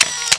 move; puzzomania

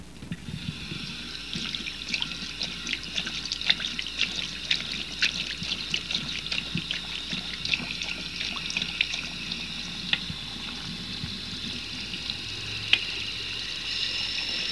WASH HNDS

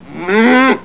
MOOOO; ANIMALS

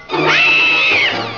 SCREAM; EXCLAIM

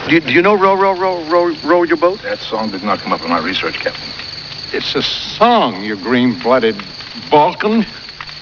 ROW BOAT

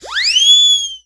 whizz, cartoon

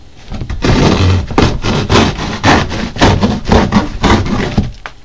HANDSAW

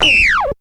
SQUEAL; BEAT2000

